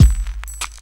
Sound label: BD, Dance Mega Drums, HIP